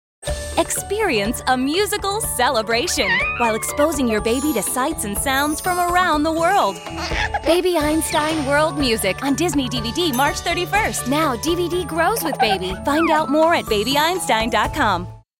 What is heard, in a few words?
world music; baby einstein